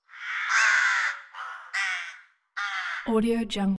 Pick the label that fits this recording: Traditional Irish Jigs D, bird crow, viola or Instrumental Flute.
bird crow